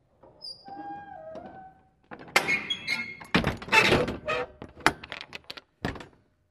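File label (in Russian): закрытие двери кузова грузового автомобиля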